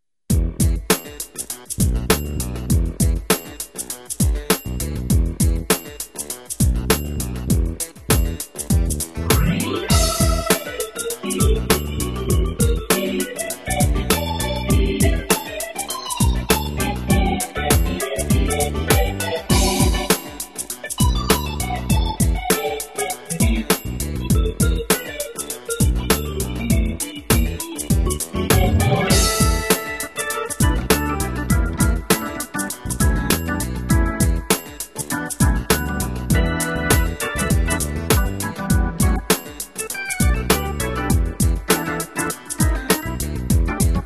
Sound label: HAMMOND